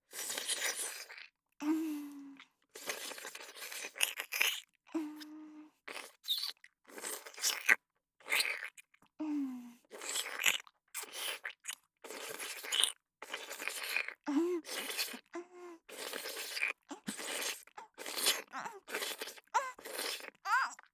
フェラ音(口内射精)

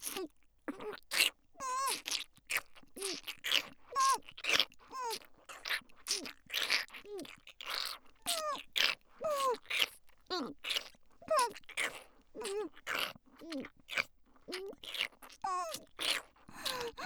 フェラ音(イマラチオ)

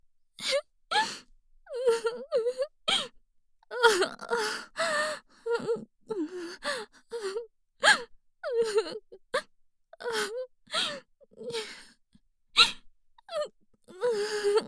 喘ぎ声(泣き)

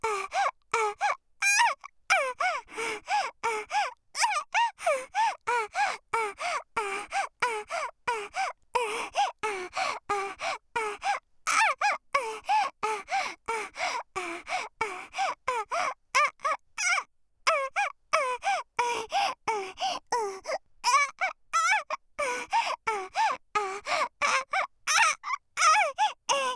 喘ぎ声(大)

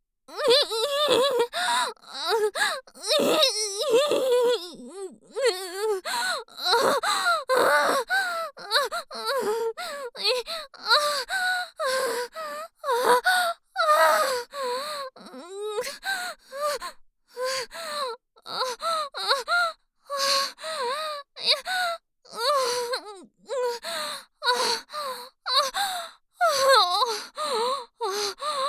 喘ぎ声(大)

